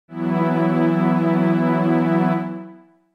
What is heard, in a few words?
E Suspended2